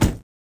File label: door close, vehicles lambo2